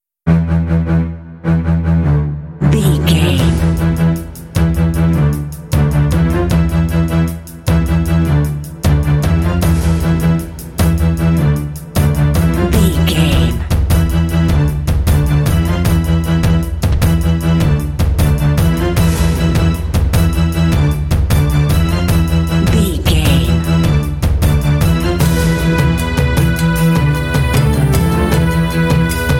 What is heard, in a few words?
orchestral tension and suspense